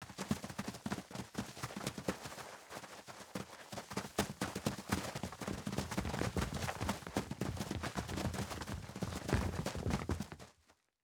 Group, Soldiers Running Grass

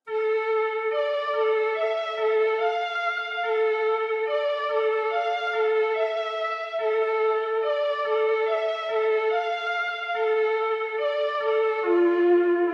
143 BPM D Min Flute; Melody; Loop Stems; Cymatics; 2022 Melody Collection Platinum Expansion - Pt 2; Takeover

